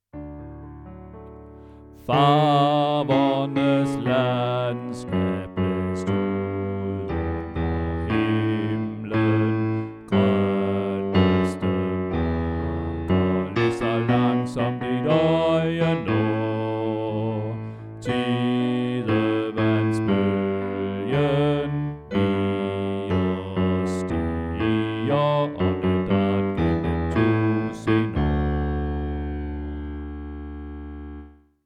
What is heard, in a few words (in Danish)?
Bas; oevefiler